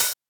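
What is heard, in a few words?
open hi hat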